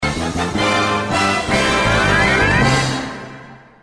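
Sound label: Win Screen; Looney Tunes - Acme Rocket Dash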